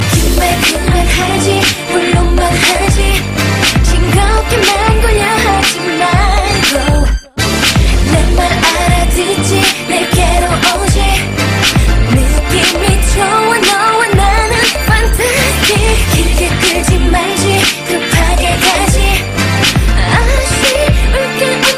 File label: top solo fantastic, hip hop ringtone